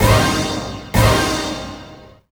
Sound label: STAB, Hip Hop R n B; USB Soundscan vol.19